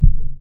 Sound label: Click